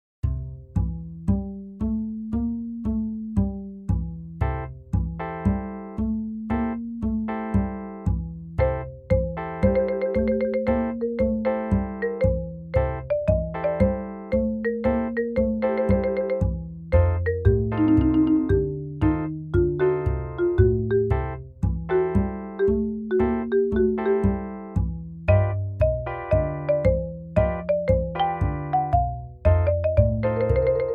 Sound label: MIDI blues; spellman studio